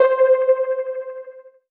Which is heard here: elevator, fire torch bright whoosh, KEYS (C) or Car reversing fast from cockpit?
KEYS (C)